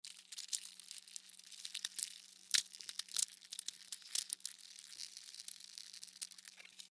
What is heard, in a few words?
Insect Bug Movement, Insect Bug Movement Eat Walk Run; Animal; Insect Sound Effects